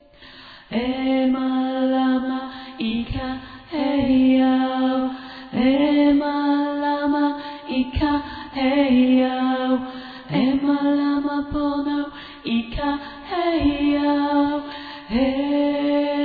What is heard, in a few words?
tenor; manchester